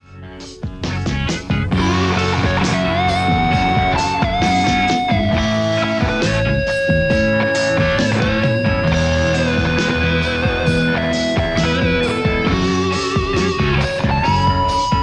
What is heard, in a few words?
lespaul amplistortion; rcvprod